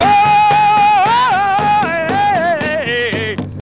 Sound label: Chanting; Gary Busey